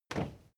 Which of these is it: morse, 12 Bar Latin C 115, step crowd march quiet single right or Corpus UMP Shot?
step crowd march quiet single right